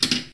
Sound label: Pinball Bumper